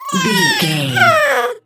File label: goblin fairy death